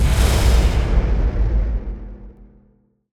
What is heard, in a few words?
airstrike; spacemap; rockets